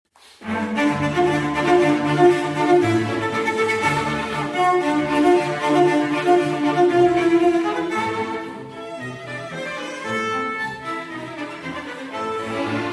Arpeggione Sonata in A Minor (Arr. For Arpeggione & Orchestra) Allegretto; D. 821